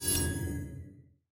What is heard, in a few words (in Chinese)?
赛程信息 列表刷新; nba